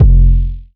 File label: 808 F; Cymatics; Oracle Sample Pack; 808s